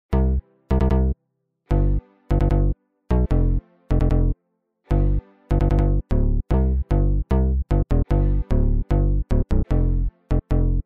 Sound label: Bass Electro